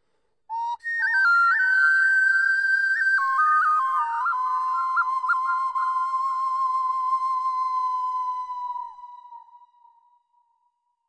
native flute high; Flutes

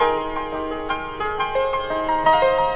087 time passing by piano melody; KEYS ORGAN PIANO LOOPS

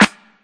classic hh Snr; STYLE KITS (Hip Hop, Etc); Classic Hip Hop Mega Kit

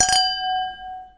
glass multi tap, Crystal Glass Strikes

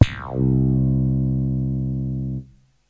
synth slap; Basses

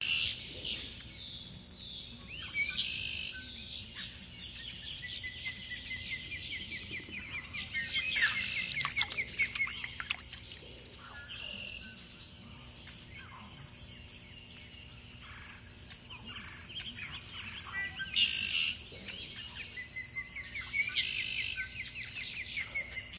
little blue heron fishes; Zoo and Nature

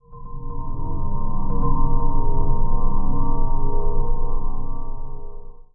moody pad; Pads

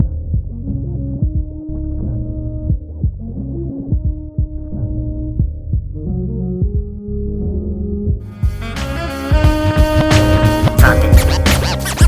Beats by the fireside

